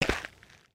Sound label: Foot Dirt Sneaker Walk, footsteps